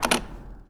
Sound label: toggle; tardis2010; garrysmod